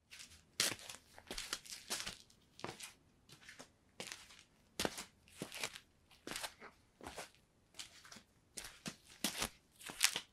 slippers; راه رفتن با دمپایی

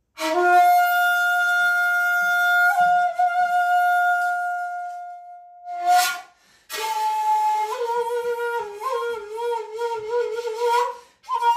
Bamboo flute

